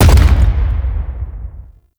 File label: Thumper Bot Thumper Impact Close; Warframe; Grineer; Movement